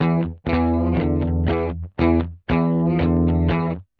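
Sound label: tension rhythm guitar, 120 bpm; SYNTH AND ELECTRONIC LOOPS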